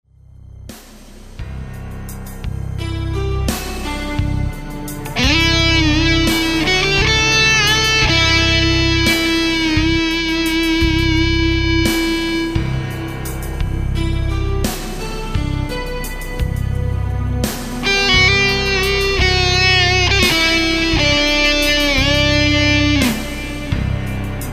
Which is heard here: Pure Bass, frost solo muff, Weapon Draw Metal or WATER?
frost solo muff